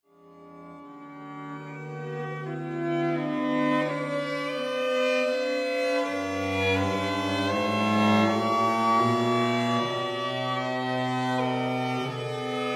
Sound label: string quartet; mozart; 19 in c k 465 dissonance; adagio allegro